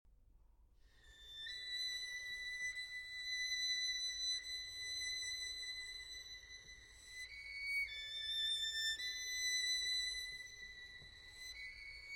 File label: Solo Violin; Chants du Sud, Six Short Pieces; IV. 4ème Chant